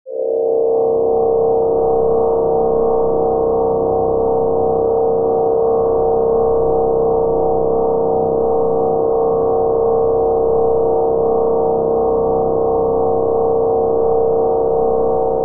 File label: drone; FMscape